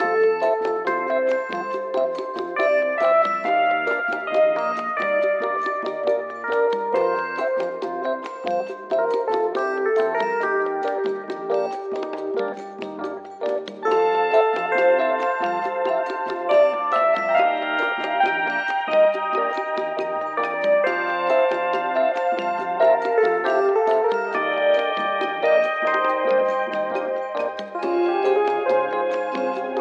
Waiting Room Elevator Music Loop; hunkenstein windows gamerip 2022

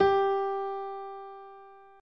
G Piano

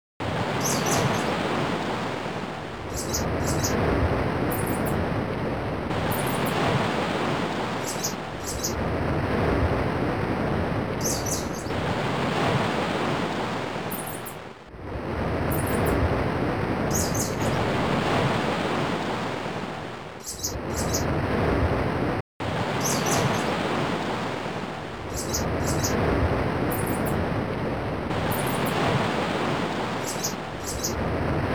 Ambient Surf and Birds

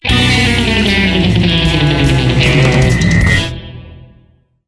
Wipeout! (Success)

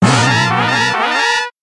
Movie BGM, Gone Wrong; Mario Artist Talent Studio

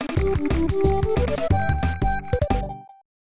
Stage Clear (Underwater); Donkey Kong Country 3; SNES

